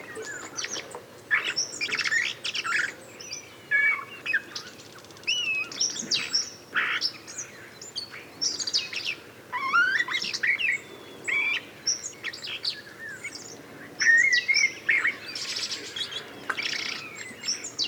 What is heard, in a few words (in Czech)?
kos cerny Turdus merula